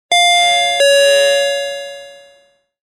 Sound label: Ding dong intercom sound; ding dong door